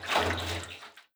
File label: contents, metal barrel; physics; props